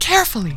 VOX CAR, VOX FX; E-Lab; X Static Goldmine 2; akai

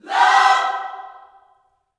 LOVE CHORD, Vocal Gospel; Spectrasonics; Vocal Planet Vol.1 Gospel; akai

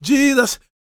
GOSP MALE, Vocal Planet Gospel

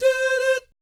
DOO DOOT, Jazz

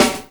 SD FUNK, SNARES; E-Lab; X Static Goldmine 2; akai

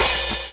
OH 70 S; E-Lab; X Static Goldmine 2; akai

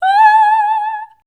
A5, Voice

